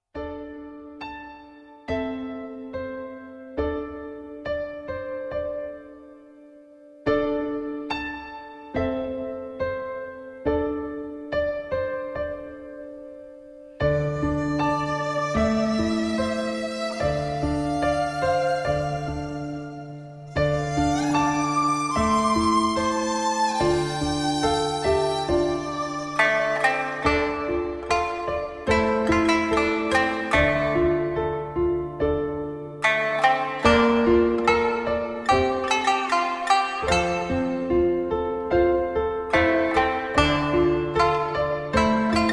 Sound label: Instrumental